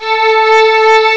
HISTRNG, CMI Factory

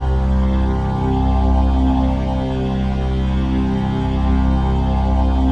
PHASE PAD; Best Service; XX Large Pads

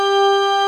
ORGAN WAVE

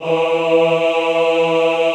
AHH E2, Extended Classical Choir; Best Service

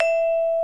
MARIMBA VIBES

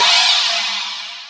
FX CYMBAL, Drum Machines, Akai MPC-3000

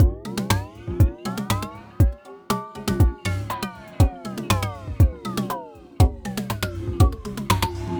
Terrestrial Hybrid, Processed Percussion

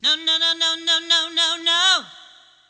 Sing Voice No No, Choir

